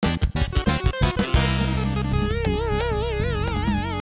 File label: Rocket Belt - Crash